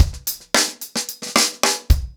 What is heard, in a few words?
Hip Hop 110BPM, acoustic drums drumloops